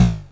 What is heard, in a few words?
Drums, Hits; DCM8